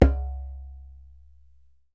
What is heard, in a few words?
DJEMBE, African Perc